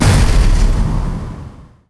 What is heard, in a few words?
righteous cast; zuus